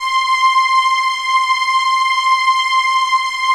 pad, atmo pads; Best Service; XX Large

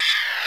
SCRAT; E-Lab; X Static Goldmine 2; akai

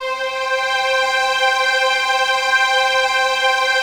PAD, ATMO PADS; Best Service; XX Large

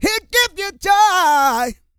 SING; Spectrasonics; Vocal Planet Vol.1 Gospel; akai

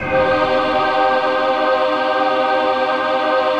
VOICE PAD, ATMO PADS; Best Service; XX Large

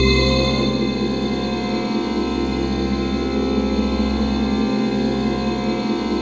ATMOPAD; Best Service; XX Large; Pads

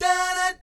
"DAH DAT", vocal jazz fx

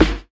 SNARE; E-Lab; X Static Goldmine 2; akai

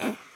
PERC

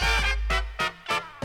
STAB

